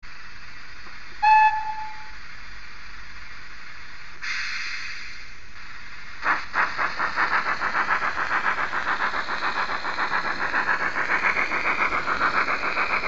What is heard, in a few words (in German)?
br96 fahren